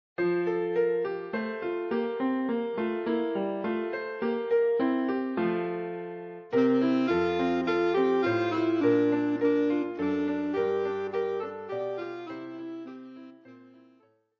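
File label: We wish you a Merry Christmas, alto saxophone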